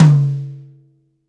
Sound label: TOM; osu